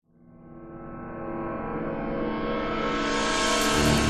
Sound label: Low Music Start; Alien Breed Trilogy; PS3 Windows Xbox 360 gamerip 2011